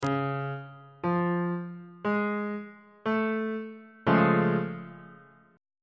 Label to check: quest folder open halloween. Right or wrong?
Wrong